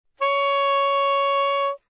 D sharp 5, soprano saxophone

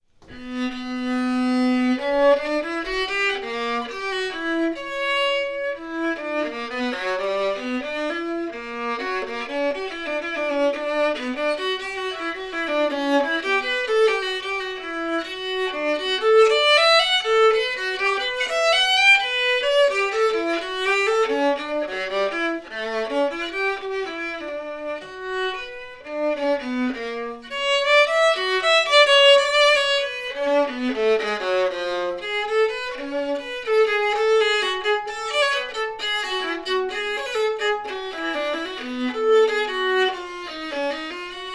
A B Bach With Bassbar

